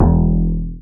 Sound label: BAS FM DX-7